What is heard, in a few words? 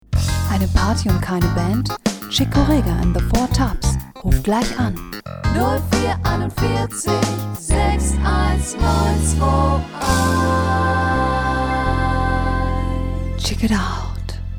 Jingle; Chick Corega